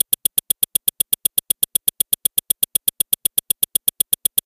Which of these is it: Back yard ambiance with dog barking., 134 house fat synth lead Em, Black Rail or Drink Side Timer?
Drink Side Timer